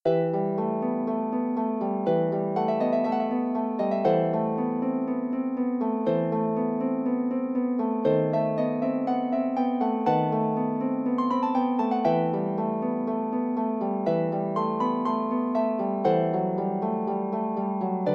prelude, Harp; Forty Eight Preludes and Fugues Book 1

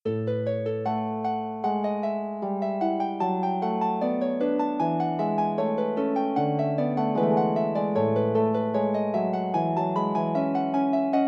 prelude, Harp

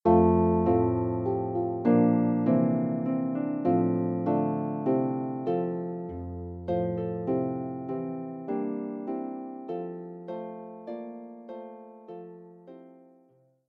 Harp

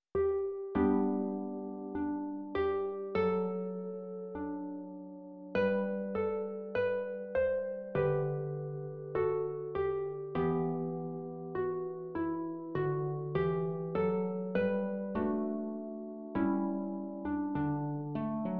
Carols for Solo Harp

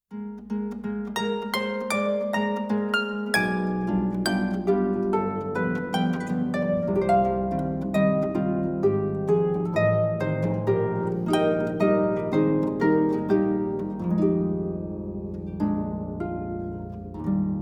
Romanze for Harp and Voice

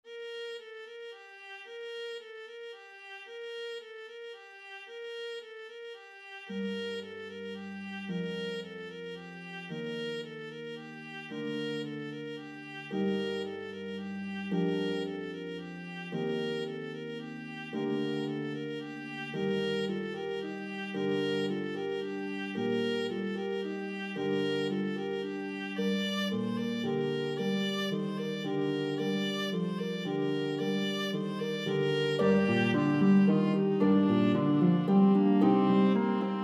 Viola and Pedal Harp

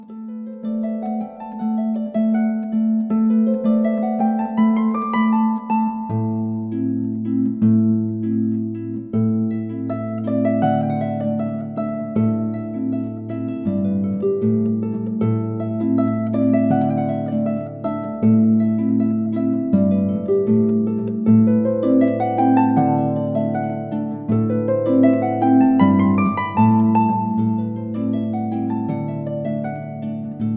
Irish Jig

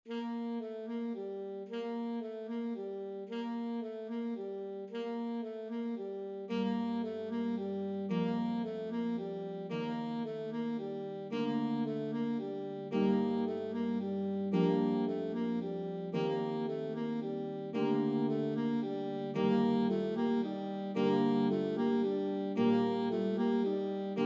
E flat Saxophone and Pedal Harp

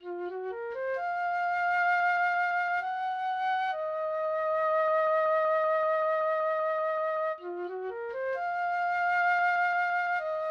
Solitude Flute

